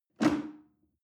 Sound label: chair wood take medium